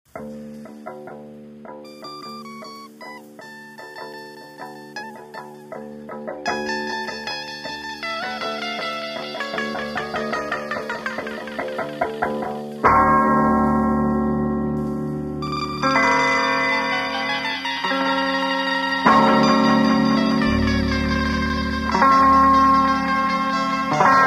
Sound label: hate noise